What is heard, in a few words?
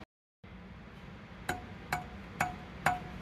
tourniquet testing 7 half turns